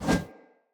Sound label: One Handed Axe Whoosh